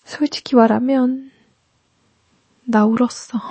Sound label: crying